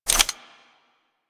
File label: Semi Mode Switch, Weapons; Warframe; Corpus; UMP; Tetra